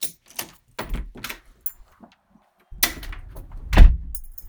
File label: get the mail, Foley; TRUTH-HZ; Crowdsourced Sample Pack